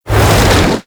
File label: Tree Slam Woosh; Enemies; Abilities; Warframe; Teralyst